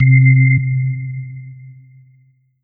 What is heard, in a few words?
Korg TR Rack Jazz Organ C3